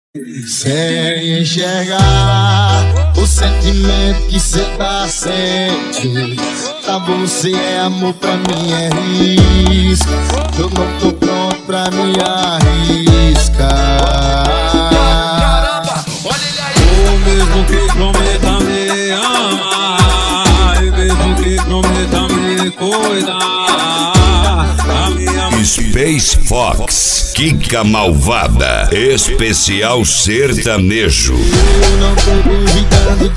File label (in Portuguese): sertanejo